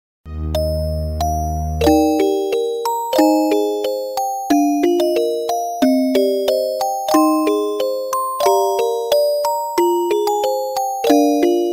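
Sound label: music box melody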